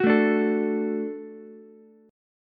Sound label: F sharp Minor Sharp 5th, Ukulele; strummed; 11,9,10,12